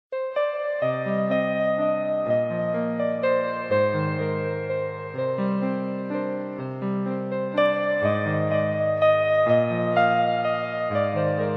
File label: meditation tone; Electronica